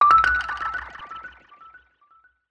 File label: Tinnitus; Vivo V5; notifications